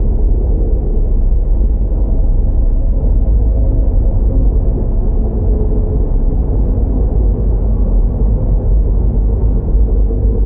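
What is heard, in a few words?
industrial interior loop; video games; source engine; portal2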